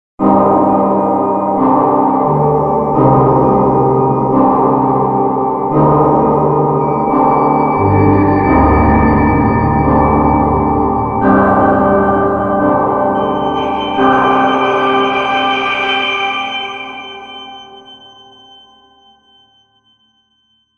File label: Modal pad